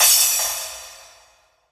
Cymbal, PsyTrance

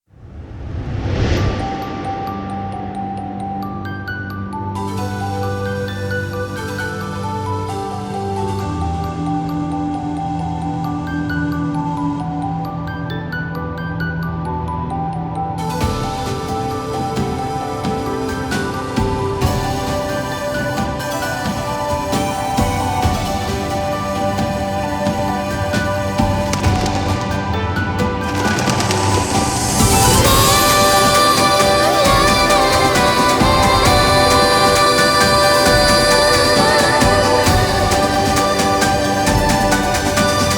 Instrumental

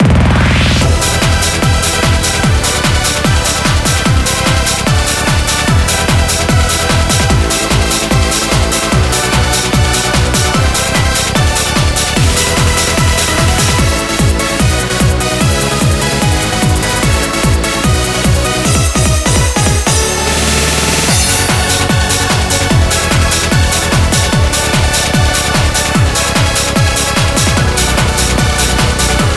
instrumental

